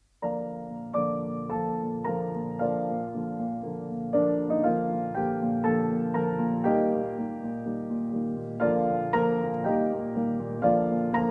Piano Trio No. 7 in B-Flat Major I. Allegro Moderato; Op. 97 Archduke